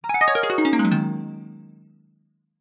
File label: fantasy magic wand spell classic harp glissando descending fairy; zapsplat